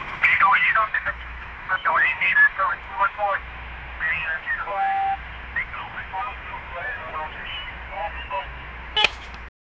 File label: crowd murmurs police radi; devices